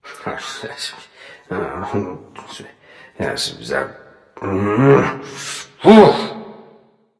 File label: grumble; cernunnos